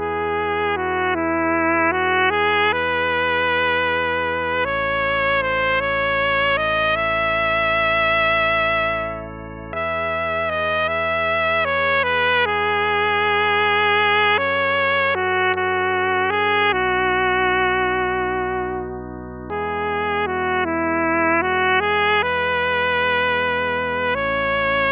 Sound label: irish lullaby